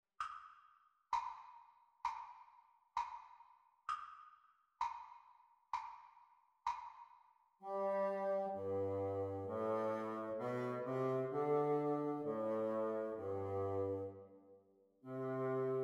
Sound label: Bassoon Duets; Practice speed